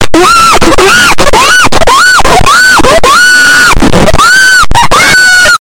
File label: screaming